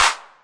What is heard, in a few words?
808 clap, drum machines; 808 Extended